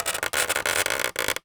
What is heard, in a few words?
Polystyrene Creak